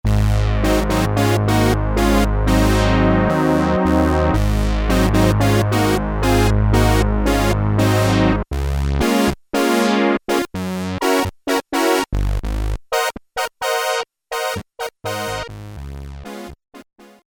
Fat Brass, Korg Poly-61M

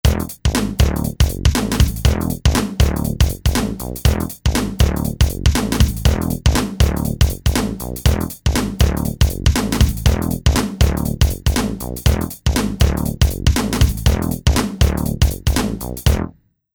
Rap Hip Hop

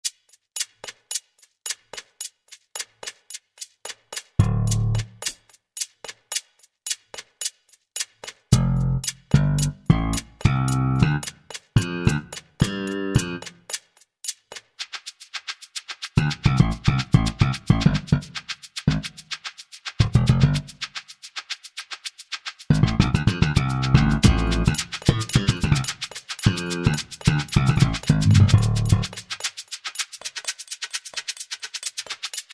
Low C Groove

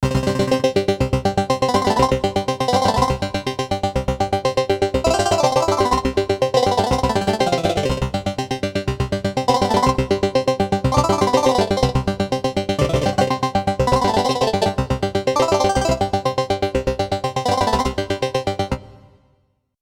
Korg Radias-R

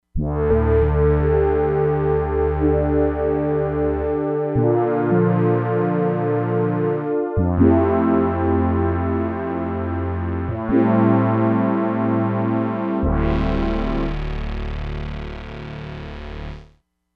INVERTED BACKGROUND, Crumar Bit 99; Factory Programs

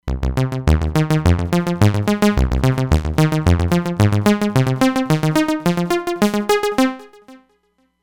Detune Seq Saw, Roland Fantom XR

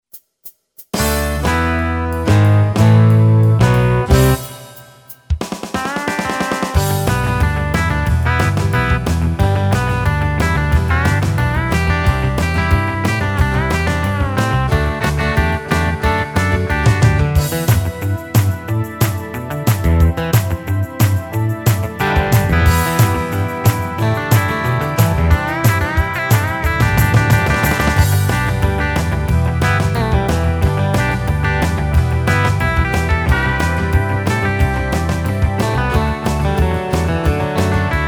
E. Gtr, Yamaha PSR-550; Factory demos; Cool! E.Gtr

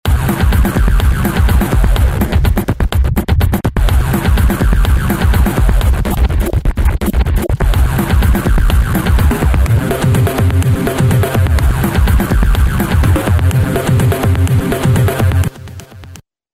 Trash Trip Pad, Roland V-Synth; Factory Patches

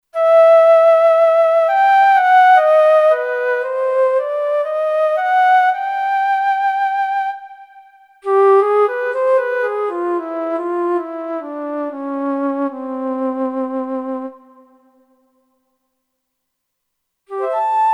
Flute, Kurzweil K2500X; Factory Programs

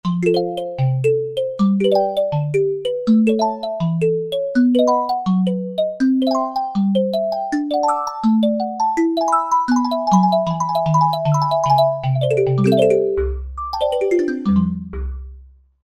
Soft Kalimba, Korg Wavestation

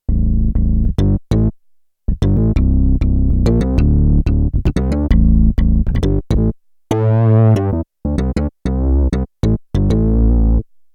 Roland D-70; Factory Performances

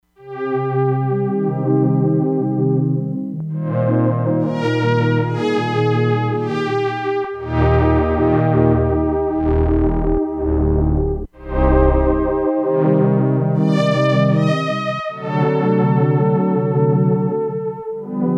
TOUCH SENSITIVE TIMBRE STRINGS, Crumar Bit 99; Factory Programs

